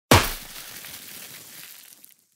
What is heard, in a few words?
confetti